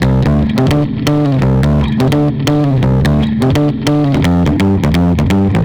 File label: Guitar, 85bpm, D2, analogue circuit; musicradar